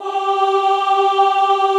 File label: OHH G3, Classical Choir; Best Service; Extended Classical Choir